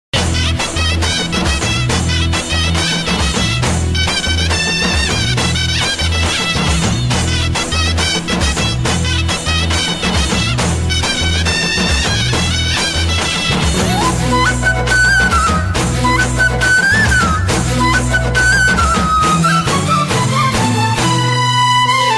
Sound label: tamil folk, sms; ringtones